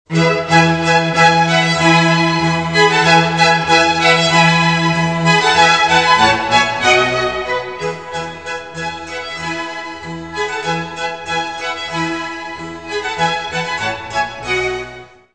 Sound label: orchestra, musical instruments